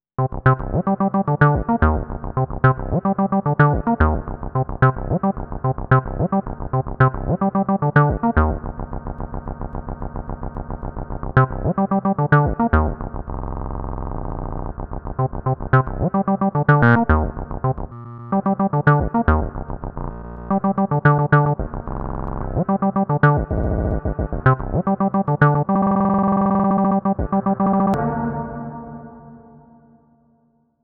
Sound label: Scatter FX